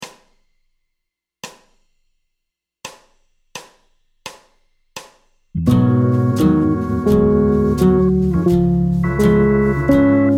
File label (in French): arp liaison Abm7 Db7 Gb∆ grave, guitare jazz; ii V7 I∆ majeur; transformations de l arpege de liaison